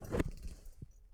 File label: Submarine Wipe